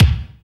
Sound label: BD, BD Dance; Sound & Vision; Gigapack I CD 1